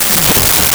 skratch; Sound Effects Library (28Cds) plus bonuses

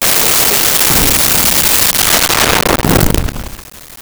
Incoming, Weapons and Mass Destruction

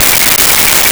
Satelite Burst Loop, Science Fiction

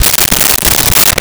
Cutting Cardboard with Scissors; Office Supplies

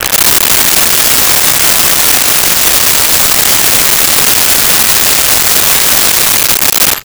Jig Saw; Tools; 1001 Sound Effects

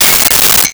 Metal Ting

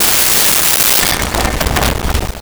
Glass Shatter Light; Impacts; SONY PICTURES SOUND EFFECTS SERIES

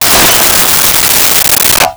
Junk Crash; Crashes

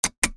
Lamp Switch; Appliances

